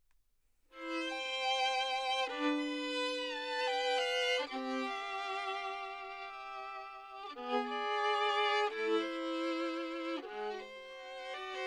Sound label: violin; Flamingo